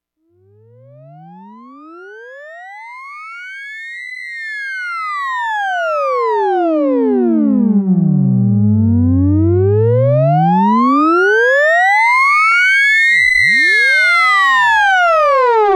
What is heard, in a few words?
noise short, ms20